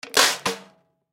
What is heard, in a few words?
Drum Snare Popcorn, Snare Drum Popcorn Throw Off Turn Off; Snare Drum Popcorn Throw Off Turn On; Drum Kit; Blastwave